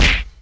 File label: punch sound